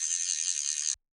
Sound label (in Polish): wyciaganie zylki szybkie; UltimateFishing2020